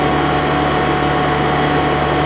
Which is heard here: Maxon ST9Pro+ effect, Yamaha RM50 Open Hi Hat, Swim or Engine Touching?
Engine Touching